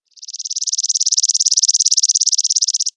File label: flit, wildlife; matmos hl